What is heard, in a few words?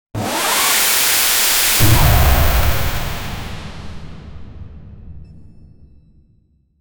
scifi blaster with energy